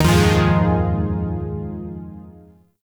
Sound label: Shawzin Min Chord F; Chords; Warframe; Zariman